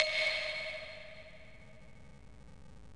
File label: brick; stardunk ios gamerip